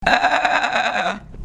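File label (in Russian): это звук старого козла с больным горлом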